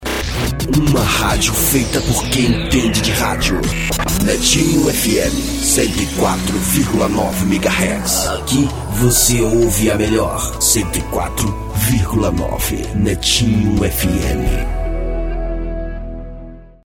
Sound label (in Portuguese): vinheta; netinho fm